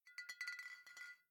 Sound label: Bottle Shake; The Town